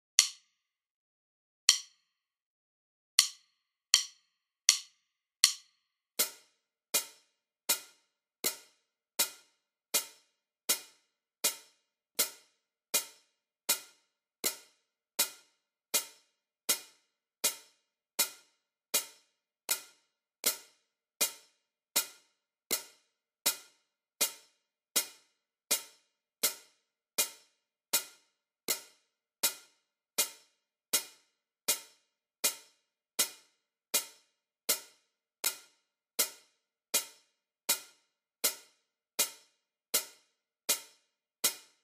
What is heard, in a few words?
Rain Song